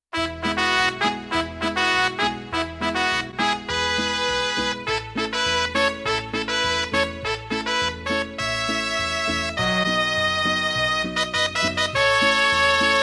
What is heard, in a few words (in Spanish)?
Mariachi